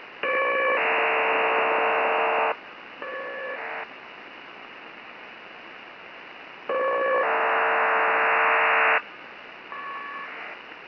CHN 30-tone, CHN-MIL 30-tone waveform; Chinese MIL 30-tone waveform sent by two stations

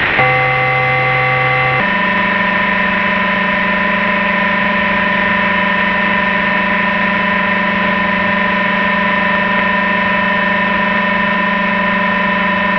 OSCR, OCEAN SURFACE CURRENT RADAR

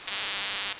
DIGISONDE 200 PPS 655ms; DIGISONDE sounder sending at 200 PPS (655 millisecond burst); ALE-systems, tone calls, ionosondes & chirpsounders

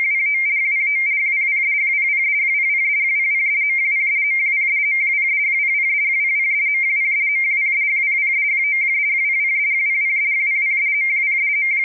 ARQ E 46 15Bd 170Hz; ARQ-E with 46.15 Bd and 170Hz shift; ARQ-1000D; SIEMENS 7-BIT SYNCHRONOUS DUPLEX ARQ SYSTEM; FSK-systems

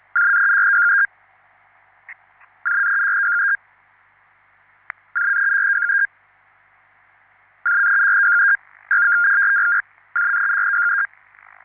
PACTOR FREE SIGNAL

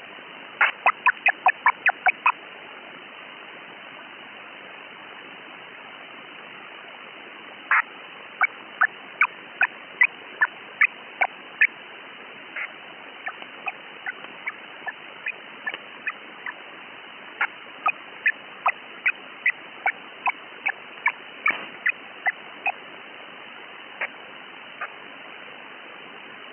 ALE4; THALES SKYMASTER 8-FSK ALE; SKYMASTER 8-FSK USING SKYHOPPER FH MODE

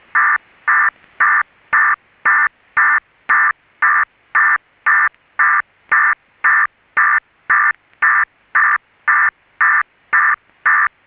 NORTH KOREAN DIPLO 600Bd FSK SYSTEM (BURST); KRE-FSK-BURST-600

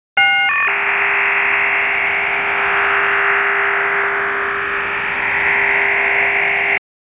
MIL-STD 188-110A App. B / 39-tone (PARALLEL); (mostly associated LPC-10e and MELP vocoder)

